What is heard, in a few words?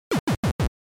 NES Fighting Fall